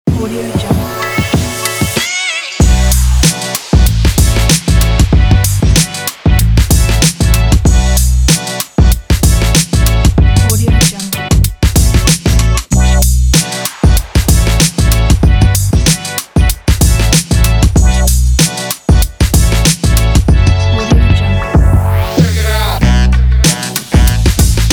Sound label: the boom bap